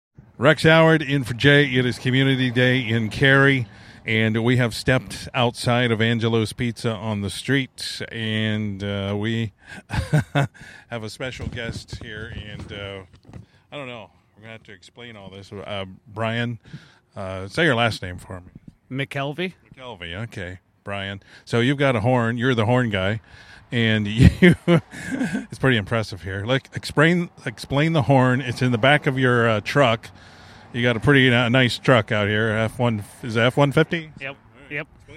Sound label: Train Horn